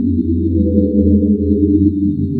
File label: Amb Scifi Energy; Energy & Forcefields